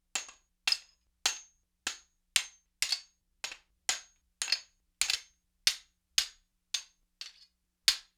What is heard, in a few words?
Mace Katana Blade, Weapon on Weapon; StillNorthMedia